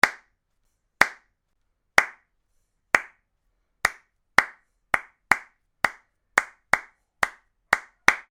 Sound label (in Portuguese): PALMAS